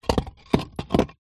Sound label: foley concrete block heavy move roll concrete ground